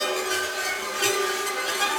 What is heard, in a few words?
Dissonant Grain Atmos, Dark Textures; Instruments; Ableton; Mood Reel